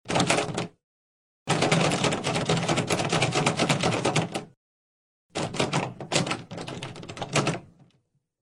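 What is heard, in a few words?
Door handle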